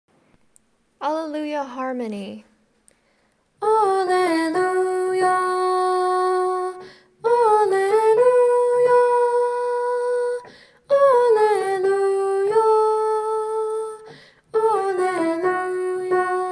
Alleluia Harmony

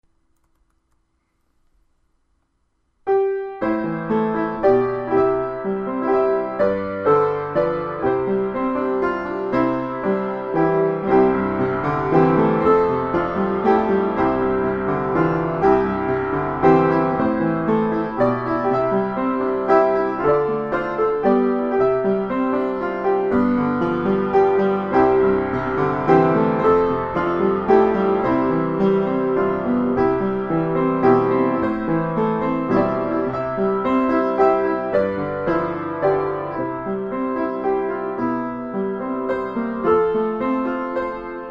Piano

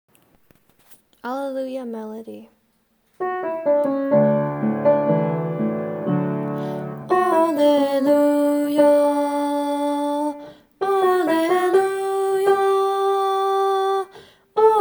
Alleluia Melody

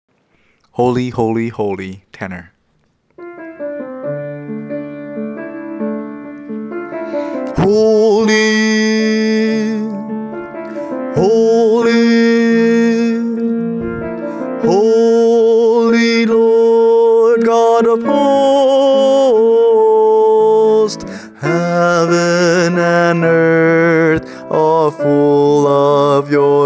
Holy holy holy tenor

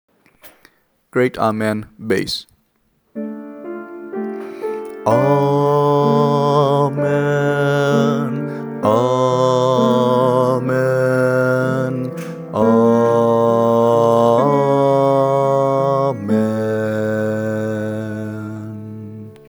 Bass

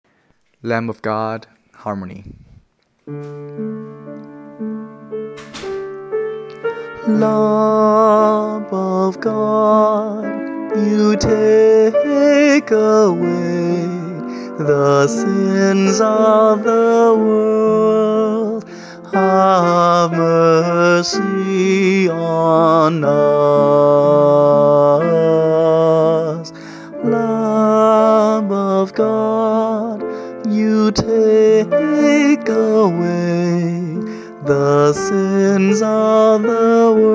Lamb Of God Bass

